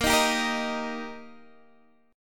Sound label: Eb Major Bb